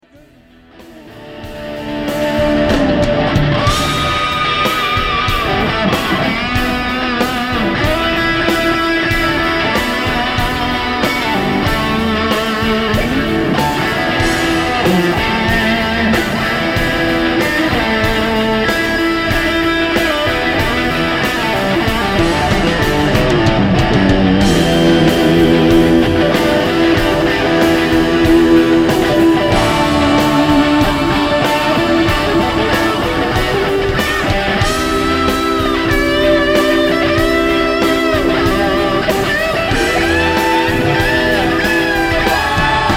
Solo Sovtek Big Muff, inner ear monitor source; Sovtek "Civil War" Big Muff; The Forum; Inner ear monitor mix 2016